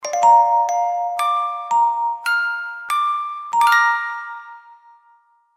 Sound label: beautiful music box; ringtones